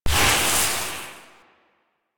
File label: Shotgun Hit Fx Stinger; Weapons; Warframe; Pax Duviricus